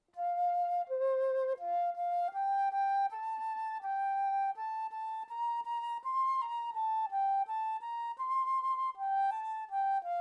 duet elizabethan dance

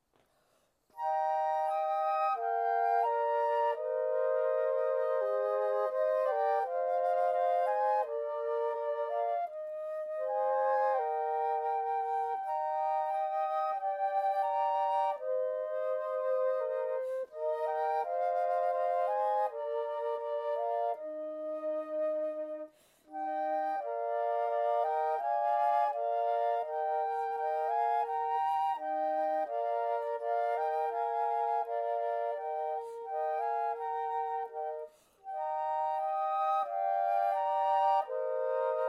trio w percussion; still still still; toe ensembles bk2

